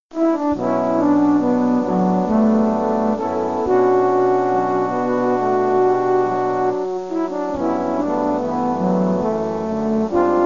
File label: trombones